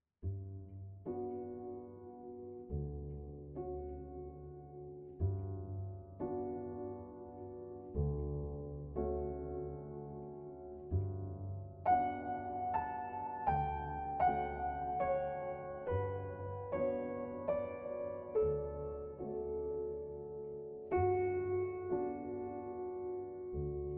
Satie Test FX

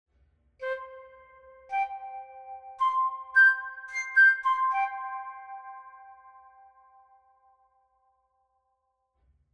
Flute NY Steinway Square FX; Karsten

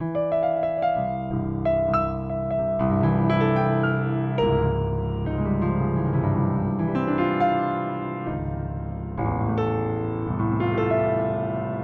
improv bass to treb

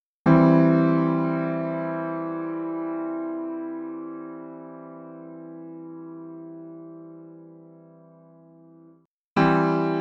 piano; Piano V vs Pianoteq Model D

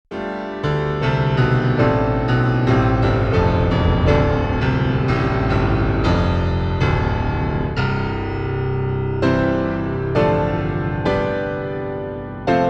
tuned resonators, piano

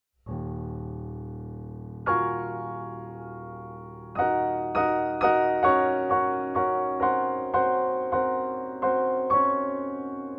Classical NY Steinway D Prelude Modified

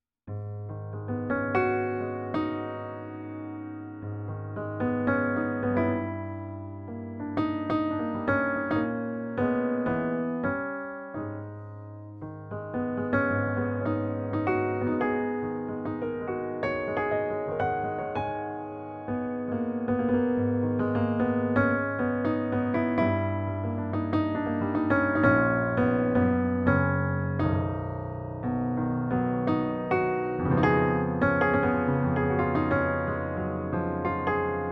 petrof mistral clean improv no reverb

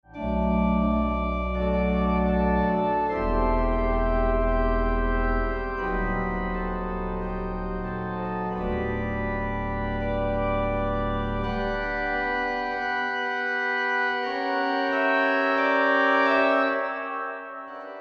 OrganTeq Dynamic

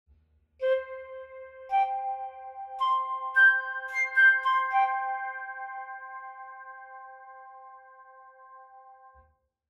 Flute FX; Bluethner M1

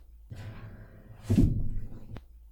Bosendorfer 200 Sustain press and release

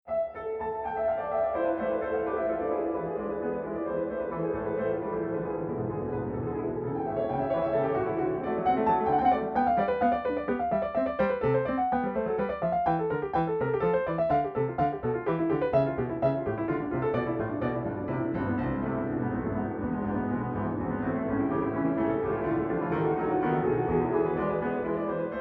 With Reverb Mix

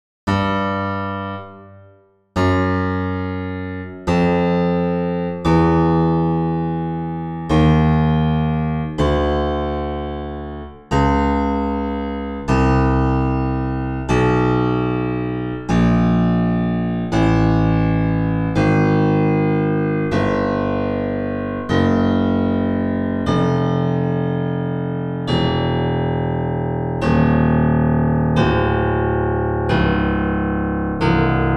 D4 bass cleaned

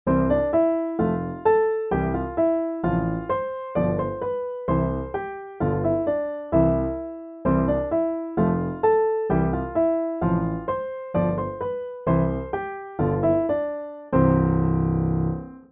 Bright Dry

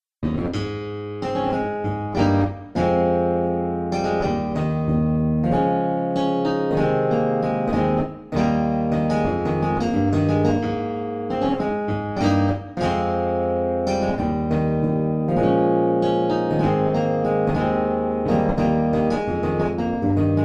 Guitar

